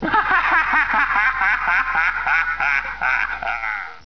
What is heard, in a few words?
hahaha; strider